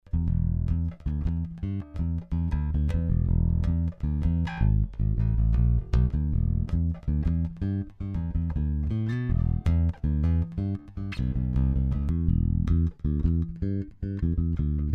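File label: Active 3 PUs Full Boost, bass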